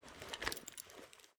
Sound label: generic cloth movement, weapons handling